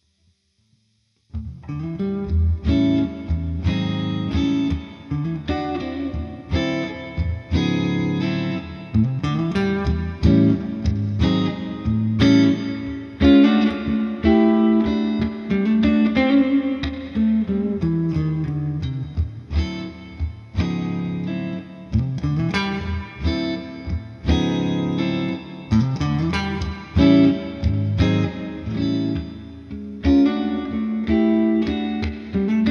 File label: Neck Fender Twin Clean, humbucker